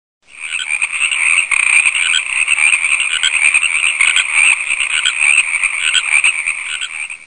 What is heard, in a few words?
tree frogs; animal sounds